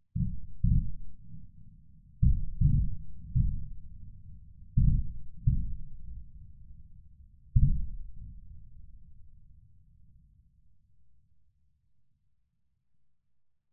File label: distant battle gunfire explosions booming